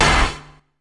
POWR CRSH; BEAT2000

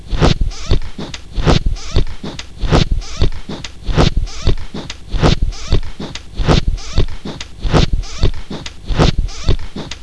CUTTING, MACHINES

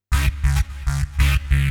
ZIPPER, KEYBOARD, HOUS TEC; MAGIX

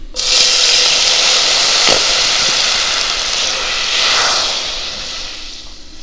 HOTFRY

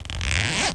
ZIPPERS

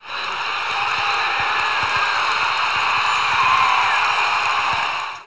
APLAUS

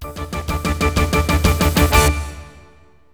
JINGLE

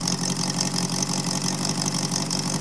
ENGINE

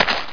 GRAVEL; JOHNNY

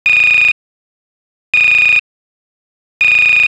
TELEF

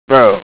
DRAW; WINFIGHT

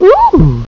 ooooh

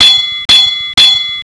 BELL

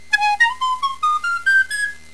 flute; Graal

